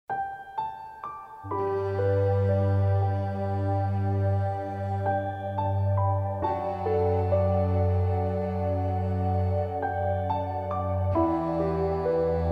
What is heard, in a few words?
Classical music